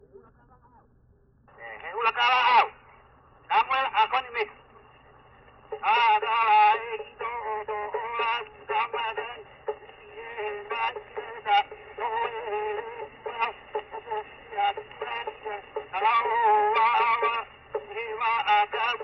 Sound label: Place-name chant, Hawai'i; World Music; Hawaiian; Na Leo Hawai'i Kahiko - Voices of Old Hawai'i